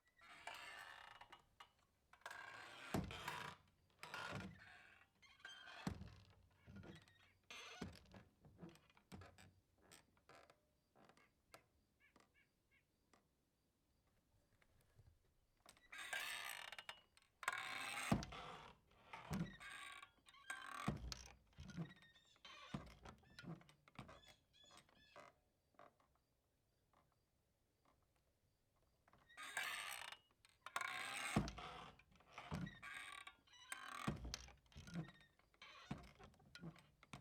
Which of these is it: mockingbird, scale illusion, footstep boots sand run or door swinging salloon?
door swinging salloon